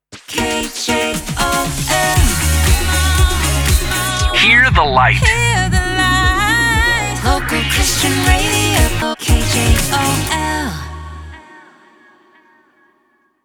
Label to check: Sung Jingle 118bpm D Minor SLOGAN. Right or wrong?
Right